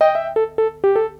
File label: online game ready; PokerTH